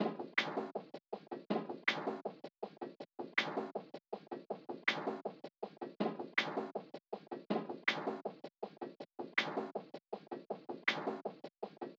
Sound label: Drum Loop, 160 BPM Percussion; Loop Stems; Cymatics; Vibes II Drum Loop Collection; Once Drum Loop